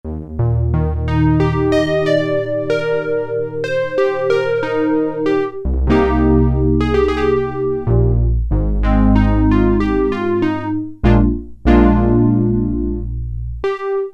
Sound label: Synthe Piano, Korg Poly-61M